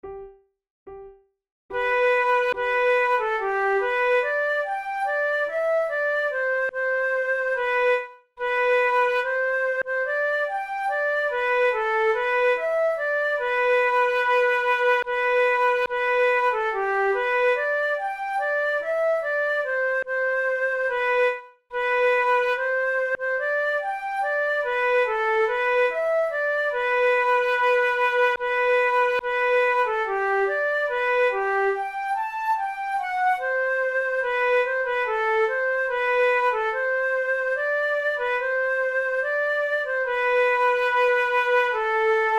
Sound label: duet, flute